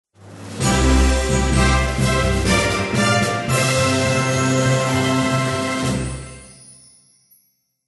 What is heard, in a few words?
BGM Fanfare